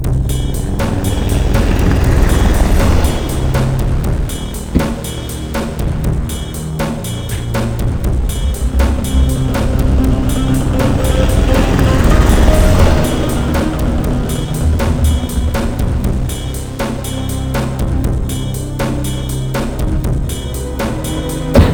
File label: Ending; Action Man Destruction X; PS1